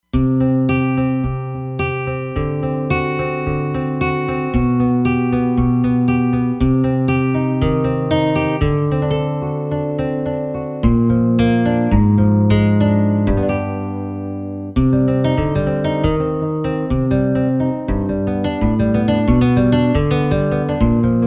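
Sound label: Nylon Guitar, Roland Fantom XR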